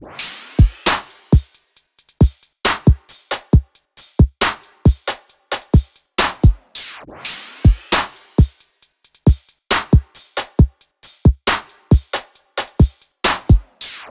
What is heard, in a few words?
Beat; Logic Pro X